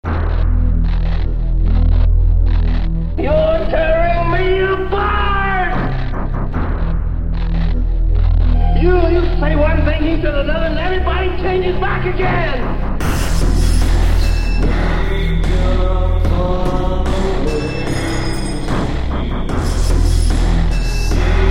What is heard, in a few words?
ground (industrial)